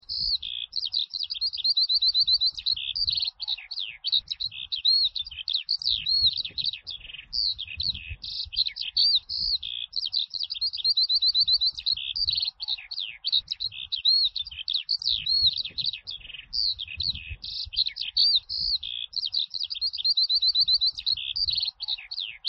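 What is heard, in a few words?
stepnoy zhavoronok voice, calandra lark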